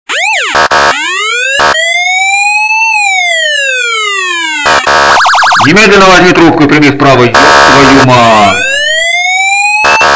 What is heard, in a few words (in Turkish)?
Polis arabasi